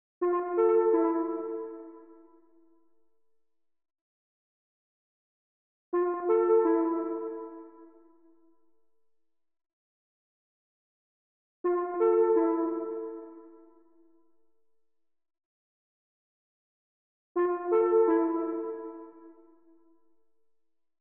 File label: 168 BPM D Min Pluck, RnB; Melody Loops; Always Yours; Cymatics; ANALOG EVOLUTION Beta; RnB Collection